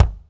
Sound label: BD, Kit; Real-Kit